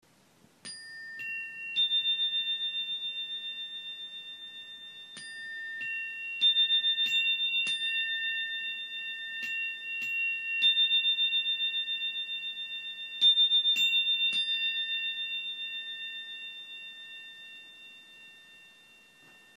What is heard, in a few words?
Energy Chimes